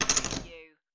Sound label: Poker Chips Drop Stack Of Chips On Wood Table